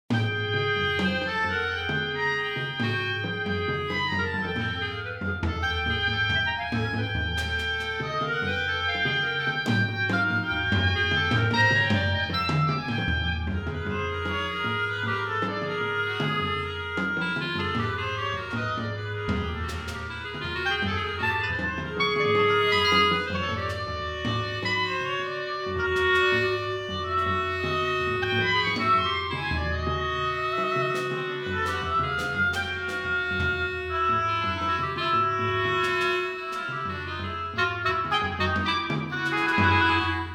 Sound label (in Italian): melanaigis, oboe clarinetto percussioni